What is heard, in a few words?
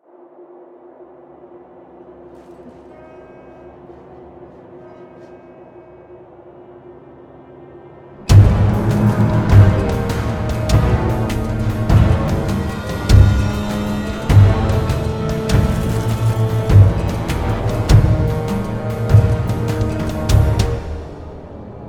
music Distillery Combat